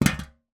household grater metal drop; zapsplat

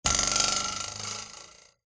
cartoon metal ruler twang wobble

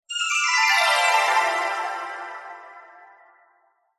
magic glissando 90s style dreamy descend

